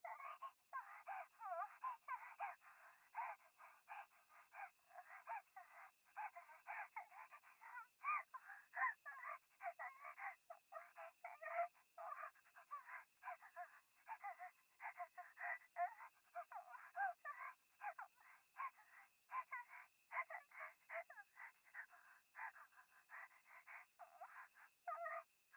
可愛い喘ぎ

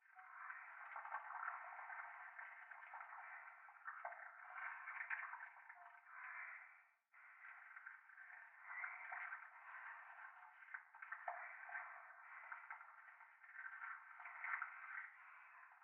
トイレ 右耳 密着 吐息 触手

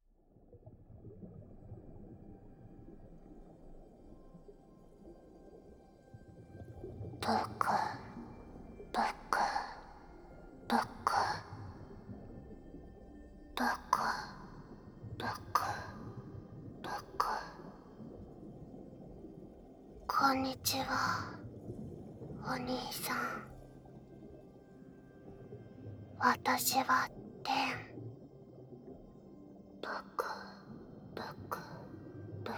安息耳舐め

